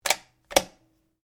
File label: RIFLE CZ 452 VARMINT 22 CALIBRE BOLT ACTION CLOSE; A selection of domesttic firearms; Rifles